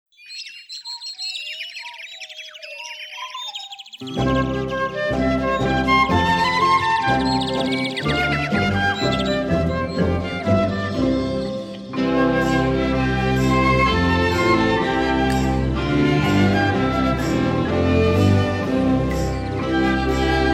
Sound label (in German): Alle Voegel sind schon da Karaoke